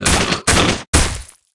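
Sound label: guardian snake spawn, monster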